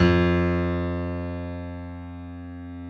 PF BDF F 1, Piano; AKAI S6000 CD-ROM - Volume 1; STEREO G-PF 2

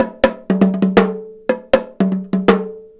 TIMB; USB Soundscan vol.45; AKAI

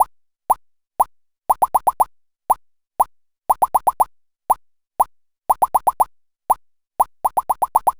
Bubble Beat, Electronic Beats; Sonic Foundry (Sony Creative Software); Myths of Technology

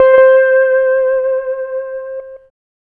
Lap Steel, Trip-Hop Loops; Sonic Foundry (Sony Creative Software); Rondo Brothers Trip-Hop Loops & Samples; Hard Rocks The Roof

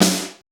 Snare, Drum One Shots

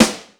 METAL SD, MOD. DRUMS; East West; Global Sounds

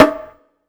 Low Bongo Open; Groove Elements

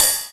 Dry Cym

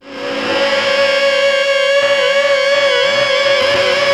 Feedback, Industrial; Nasty

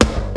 POWER DANCE, DANCE KIT; Crypton - DrumBase5000; DANCE KIT #3

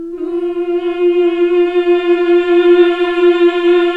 Nuns Mmmhh; Sound & Vision - Gigapack II

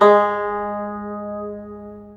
GUZHEN G#3; Spectrasonics; Heart of Asia

